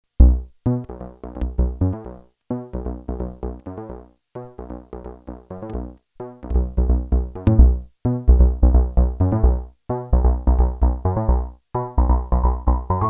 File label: juno106